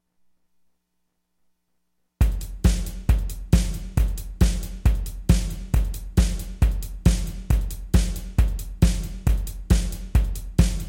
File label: Drum Test